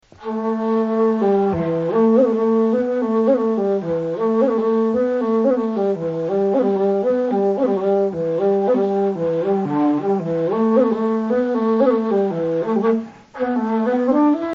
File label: Maids flute